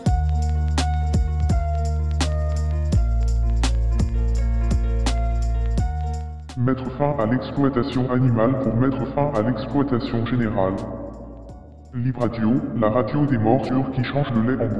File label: Jingle Morsures